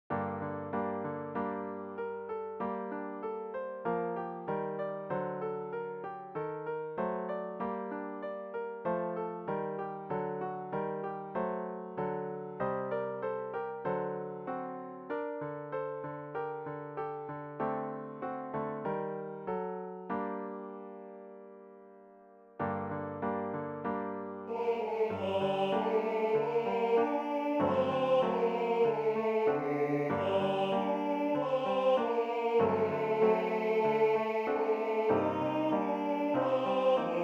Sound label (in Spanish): bajo; Cantata para SATB, Solistas y Narración